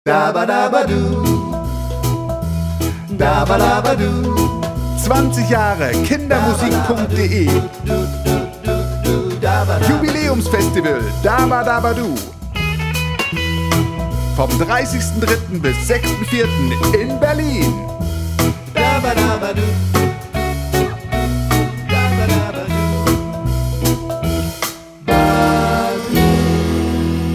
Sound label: Jingle; DABBADABBADU; Familienfez 2019